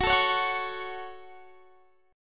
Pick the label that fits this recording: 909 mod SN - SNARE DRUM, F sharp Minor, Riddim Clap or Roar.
F sharp Minor